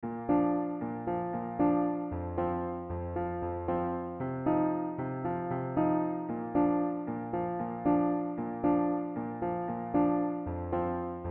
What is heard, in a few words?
bg music